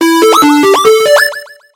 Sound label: bonus win, pinball